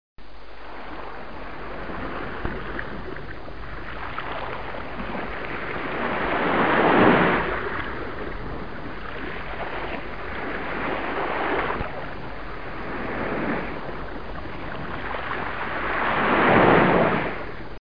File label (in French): ambiance; Reperages 94; tortue